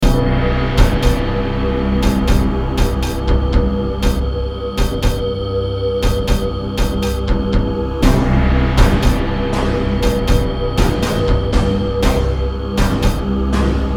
Beat Rhythm

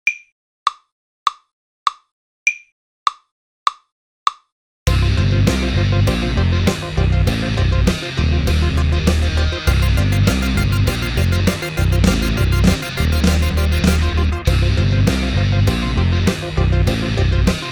Grand Flam

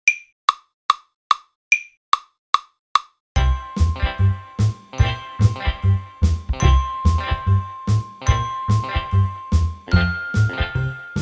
12 Bar Blues in C

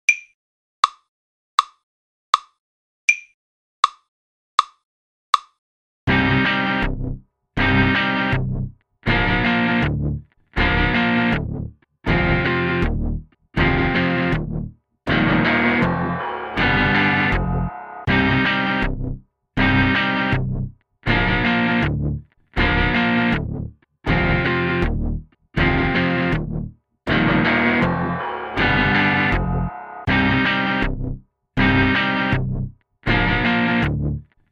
Kit Solo

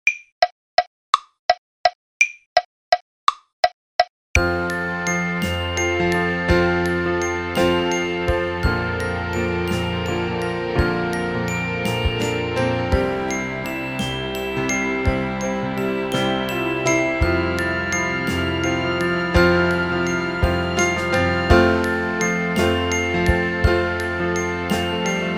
Arpeggio Solo

